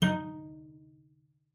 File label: D#4, CELLO FINGER; 90 s Sample CDs; Xsample VOL-5